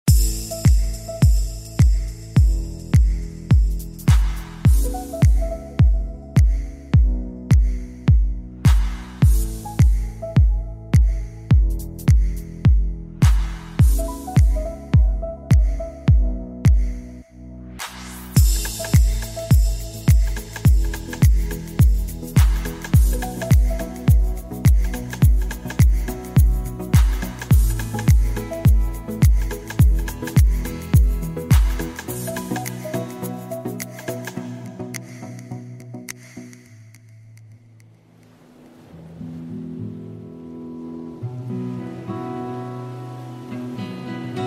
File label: Tropical House